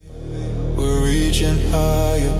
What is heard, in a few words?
remix